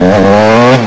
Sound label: throttle, YAMAHA